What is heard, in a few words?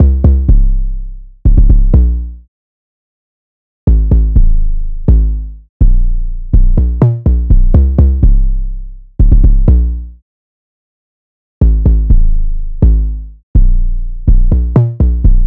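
808 C minor 160bpm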